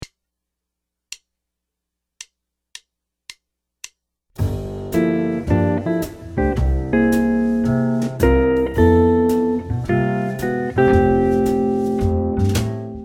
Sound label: ii V I chord solo lick, guitar